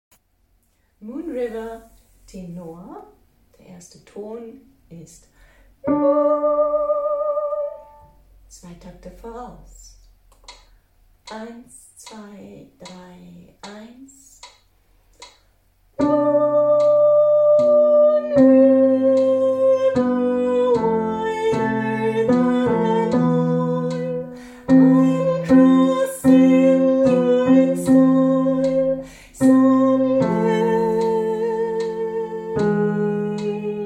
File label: Tenor